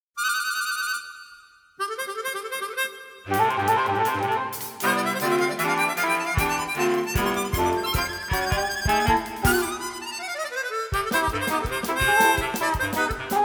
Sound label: stress polka, harmonica